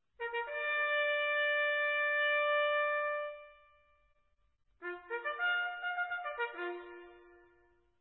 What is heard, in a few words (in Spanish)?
rompanfilas, corneta